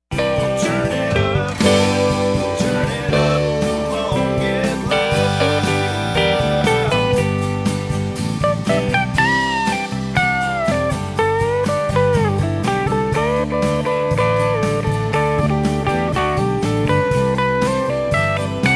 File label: solo out